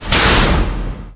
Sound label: CRAC